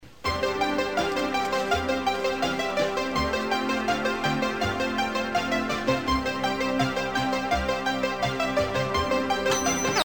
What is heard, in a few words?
Jolly Little Jingle From The TV Show; Catchphrase